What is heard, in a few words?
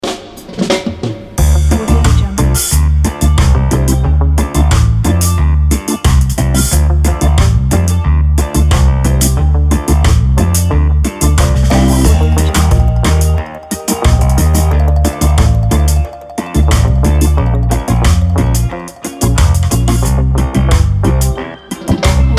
reggae loop